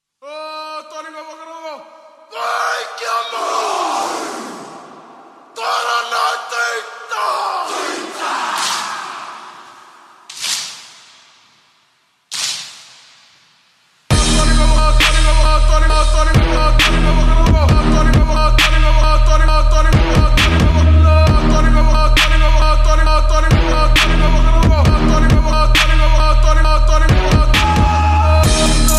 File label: Maori Haka